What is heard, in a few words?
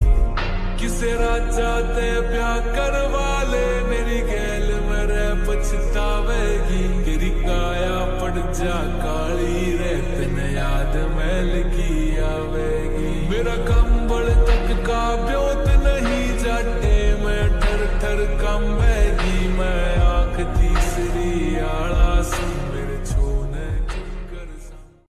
Lofi